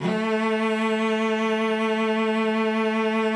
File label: A3, cello vib; Xsample VOL-7